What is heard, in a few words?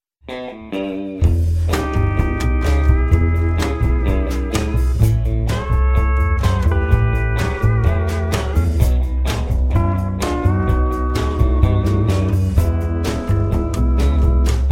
country rockabilly ringtone